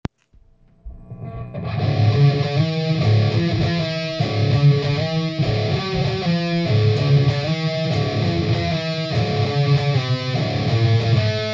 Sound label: metal riff